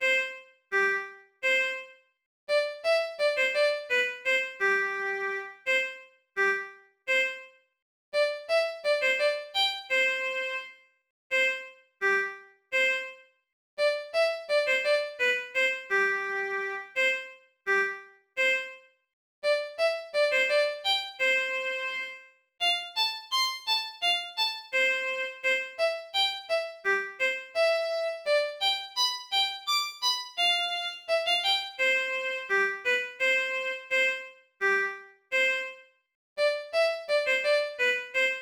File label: Fiddle Game Tune; Christmas Carol